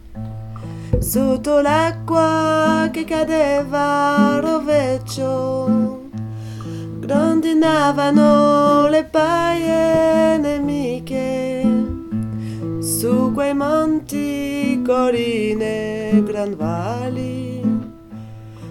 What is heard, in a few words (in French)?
2eme voix femmes; Gorizia